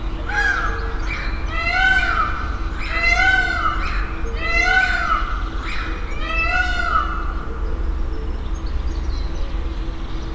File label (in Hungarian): páva, szellőztetőgép; állathangok; nyíregyházi zoo 2010